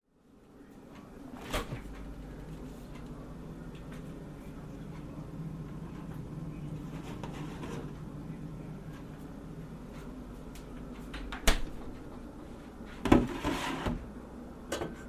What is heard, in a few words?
Pouring Milk